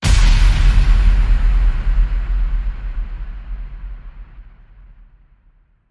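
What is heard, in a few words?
Hit Ambient Trauma Hawk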